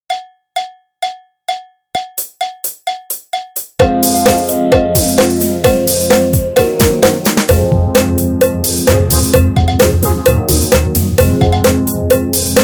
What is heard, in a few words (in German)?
Loop Binaer 130